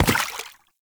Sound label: Step Water, Foley, Footsteps; Enemies; Warframe; Lasrian Soldiers; Lasrian Butcher